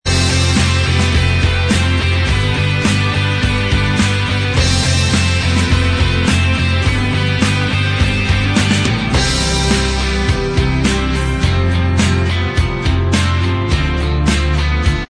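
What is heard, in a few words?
instrumental, track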